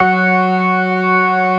STOPS, ORGAN; Keith Emerson Signature Series

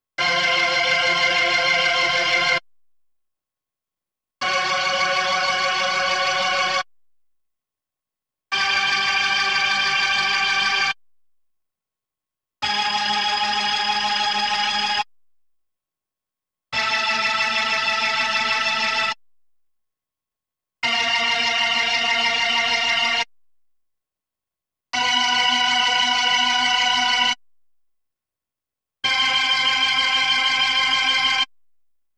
B3 Organ; Masterbits CLIMAX 8

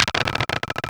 PHONE; Distored Reality

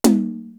TUNED TOM; East West; Global Sounds

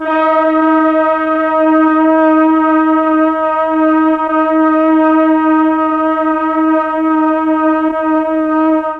D#4 BRAS, Mellotron; HALFSP.BRASS; Mike Pinder - Mellotron Archive CD

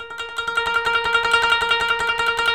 KOTO TREM

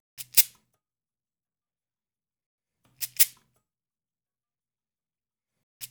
loop, percussion

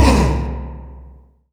C1, Synth Line; 90 s Sample CDs; NiceBeats; SynthLine VOL-30

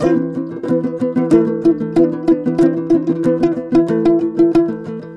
TOPSHUR; Soundscan vol.60; Asian Traditions; AKAI

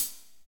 HAT, Roland

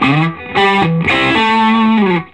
Vintage Blues Guitar, SOLO; USB Soundscan vol.22; AKAI

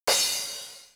RM1X Drum Anlg Kit Crash Hard; Yamaha RM1X

